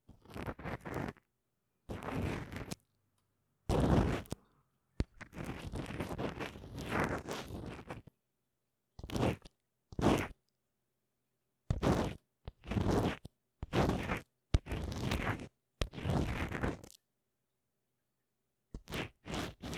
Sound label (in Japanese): 濡れ綿棒; 耳が気持ちいいASMR 2【クリームマッサージ/濡れ綿棒耳かき】